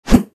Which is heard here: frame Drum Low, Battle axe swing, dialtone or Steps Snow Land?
Battle axe swing